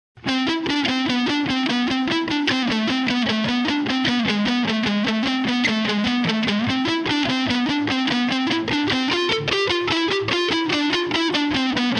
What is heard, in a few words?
walking slow